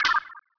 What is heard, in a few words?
ui strength confirm